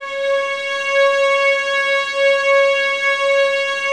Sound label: violins C#5, string ensembles; Miroslav Vitous; 11 Violins